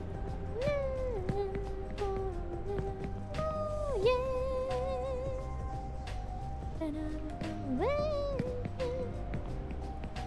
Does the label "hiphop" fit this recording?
No